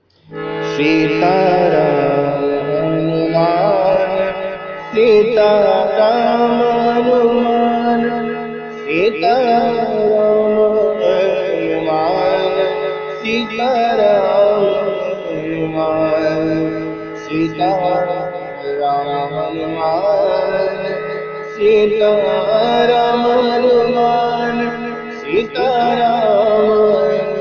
Sita Ram

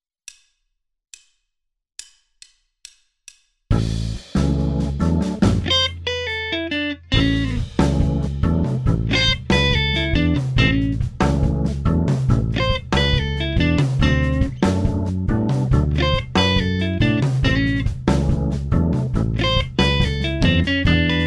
style Blues Rumba guitar; lesson

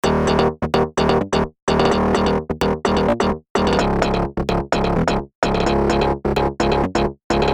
Bassline

